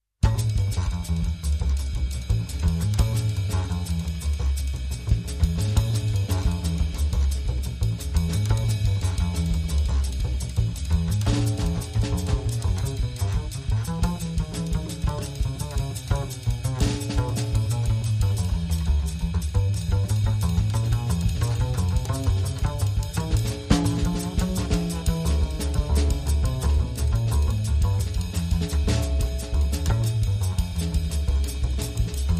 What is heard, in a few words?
Swing Vibe